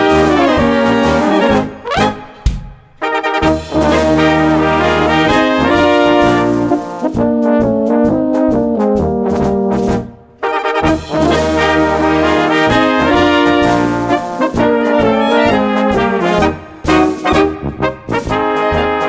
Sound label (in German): Polka, alpenbrass